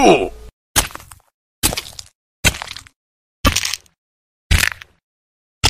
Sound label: minecraft dead sound